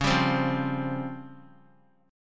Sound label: C sharp Major 7th Flat 5th, C sharp, chord; strummed; 25,26,27,27